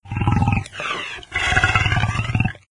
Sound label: idle, doom3 pinky